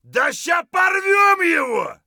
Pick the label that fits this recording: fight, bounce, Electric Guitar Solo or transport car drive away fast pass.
fight